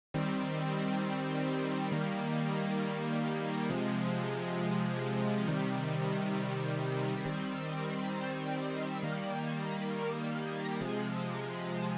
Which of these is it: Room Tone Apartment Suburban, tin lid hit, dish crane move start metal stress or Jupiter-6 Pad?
Jupiter-6 Pad